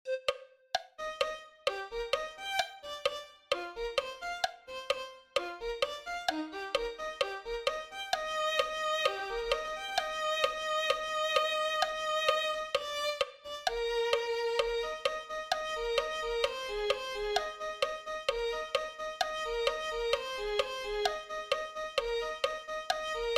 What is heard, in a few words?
violin 2